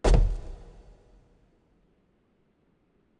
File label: Footstep Metal